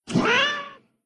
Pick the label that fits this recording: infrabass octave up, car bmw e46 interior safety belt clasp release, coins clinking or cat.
cat